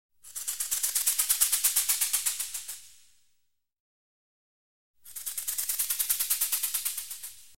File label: Animal stinger - Medium rattlesnake whoosh by x2; Hollywood Edge Sound Designers Tool Kit